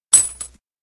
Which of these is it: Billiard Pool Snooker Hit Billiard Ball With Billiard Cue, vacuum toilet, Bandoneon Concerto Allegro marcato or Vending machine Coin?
Vending machine Coin